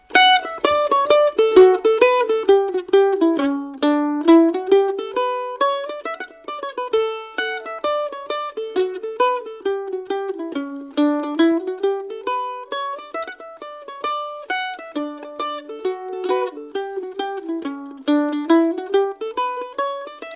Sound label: mandolin; flowers of antrim